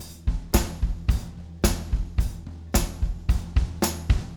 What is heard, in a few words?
110 tam